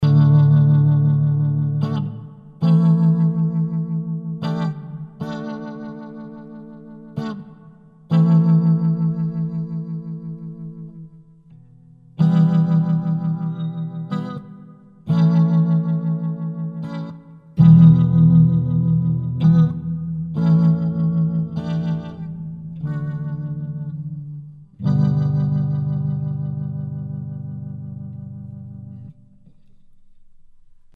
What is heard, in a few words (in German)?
tbone rb770 e gitarre